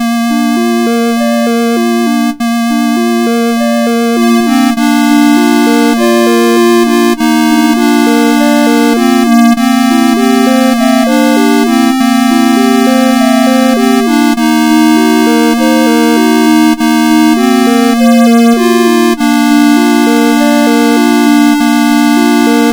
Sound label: Ancient Gaelic Benediction, CSG8580R5